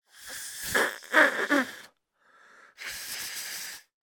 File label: human person blow nose